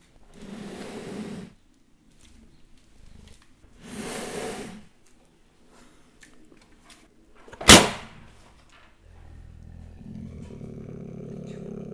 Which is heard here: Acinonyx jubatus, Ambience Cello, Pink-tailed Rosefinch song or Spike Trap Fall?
Acinonyx jubatus